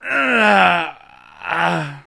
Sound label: Mob die; Monster; 묘족; 호위무사